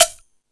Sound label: Percussion FX, Drum Machines